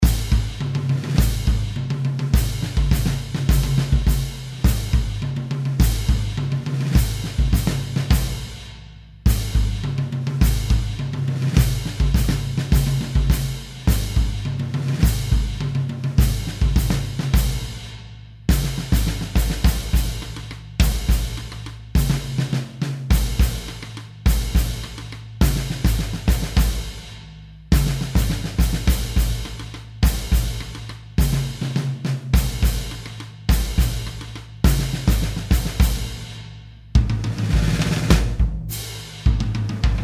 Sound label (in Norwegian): Trommemarsj